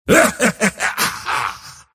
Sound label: attack pain; mars